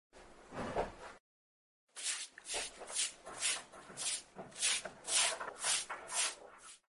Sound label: cleaning Chill